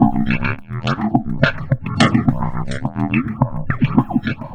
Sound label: Processed Perc; Drums & Percussion; Processed Percussive; Sonic Foundry (Sony Creative Software); Electrocution; Beat Components